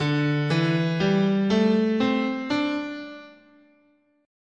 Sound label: D Suspended Pentatonic, scale